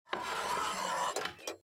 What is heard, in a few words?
ballista trigger set; out of reach treasure royale 2020 gamerip pc